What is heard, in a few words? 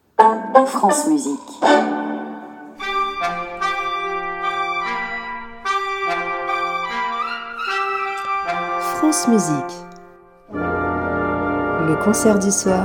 Jingle France musique; Stravinsky